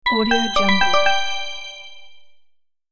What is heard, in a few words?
ecommerce complete purchase